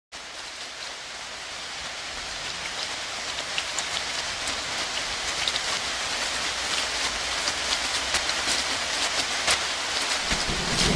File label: thunderstorm; performance simulation effects